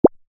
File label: Footsteps Comical One